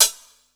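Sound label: closed hi-hat, Drum & Bass, 160 BPM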